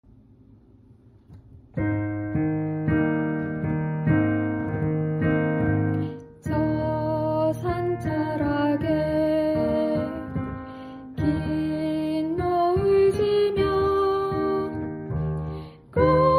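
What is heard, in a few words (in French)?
belle patrie soprano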